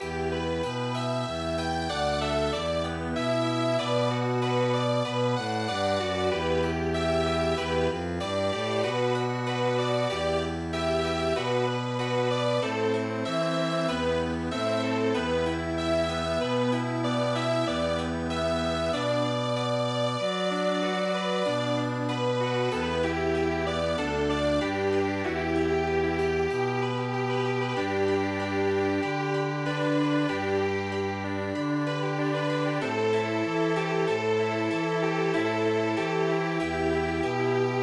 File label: Chamber Music